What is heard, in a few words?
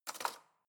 knife wood drawer place soft